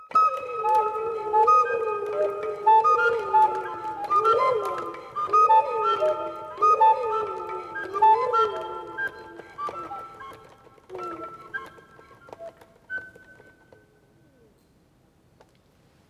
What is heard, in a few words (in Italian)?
organo